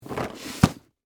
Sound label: Cardboard Box Stand, Foley, Cardboard, Box, Stand, On, Side; Materials; Blast Wave